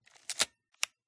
Camera Film 35mm SLR CANON AE-1 Program Film Advance Wind lever; MKH8040; PMSFX